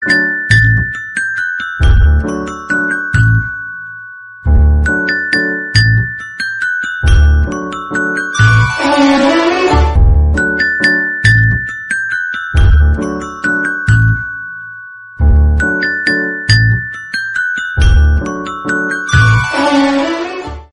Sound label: flute